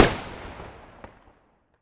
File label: Explode; blastmorph pinball; xbox series xs